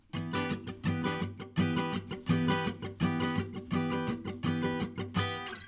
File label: funky, guitar